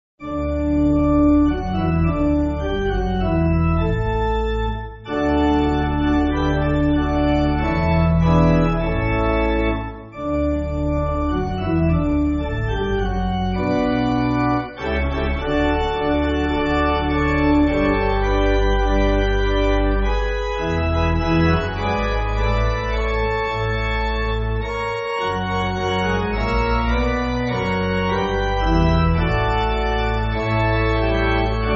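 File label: Pipe Organ, Music Backing Files for Churches; Like Caleb And Joshua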